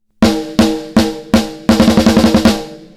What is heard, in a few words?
snare